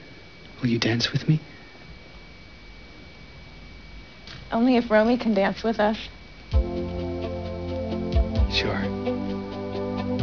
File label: dance